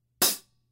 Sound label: K Custom Hi Hats Closed Hit